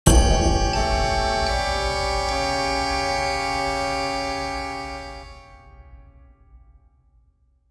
Miss; Shanghai IV Longmen Hiyaku

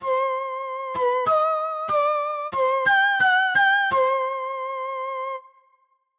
Flute Jingle; Kharon's Crypt

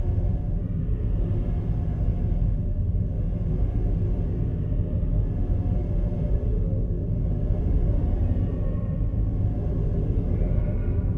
Ambient

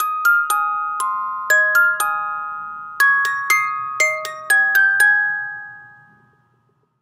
Music Box; Assassin's Creed Syndicate

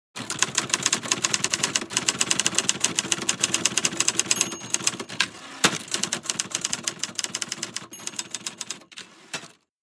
Typing!; Katahane

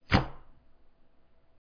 Slamming Pencils; Learn to Fly 2

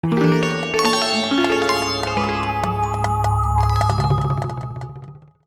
Opening Jingle; Horror Land

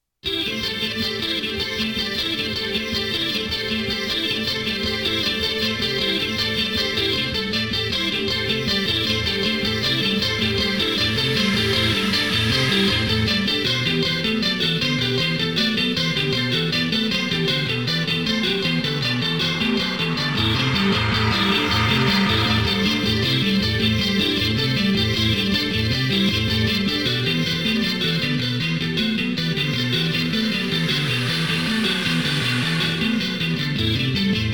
FOUR GUITARS; henry explorers konami rpgs original game soundtrack

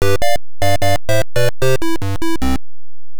Miss; Super Mario Bros Special; PC-88

